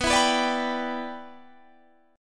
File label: E Minor 7th B